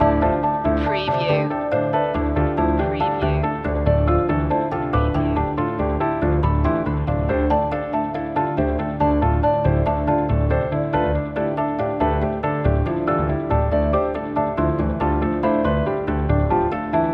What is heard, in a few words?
mind matrix loop